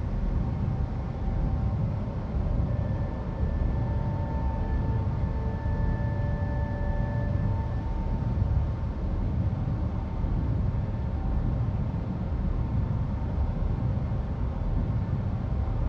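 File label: interior power relay corridor; Titanfall 2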